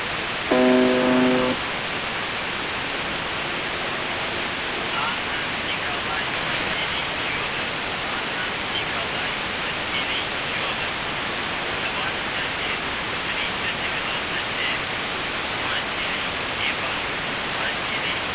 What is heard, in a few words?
buzzer usb msg